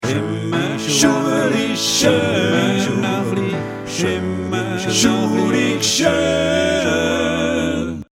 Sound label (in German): Der Klingelton